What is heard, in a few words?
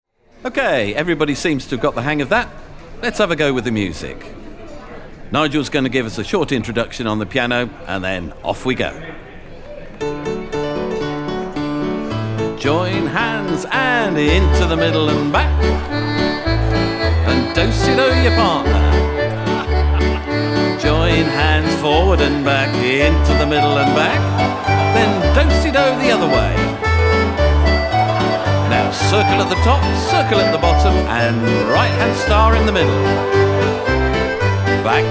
hornpipe; Marmadukes